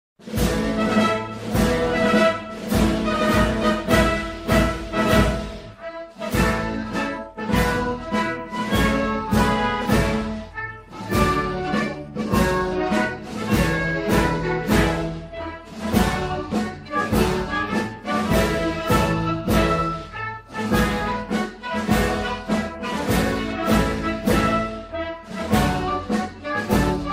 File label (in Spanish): Himno Nacional; Yarano; Far Cry 6